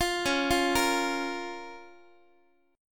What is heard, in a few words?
Db 6th, Guitar; Db6 arpeggiated; JGuitar